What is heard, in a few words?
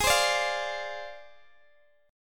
G sharp 6th Flat 5th G sharp, down, Guitar, DADGAD